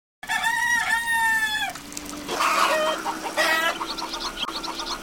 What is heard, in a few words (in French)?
poules